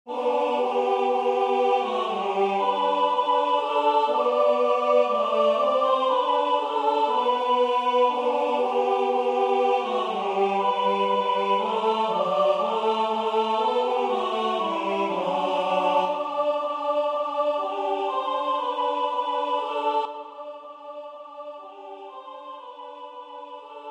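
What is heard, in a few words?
Sopraan; Hark the herals angels sing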